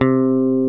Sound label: C3 PICK BASS